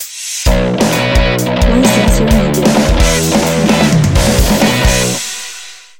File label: Rough Bass